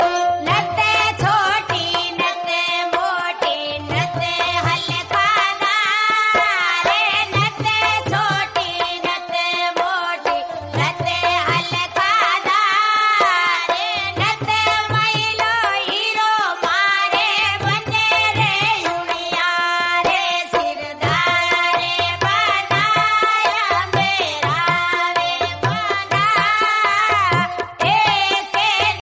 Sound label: rajasthani ringtone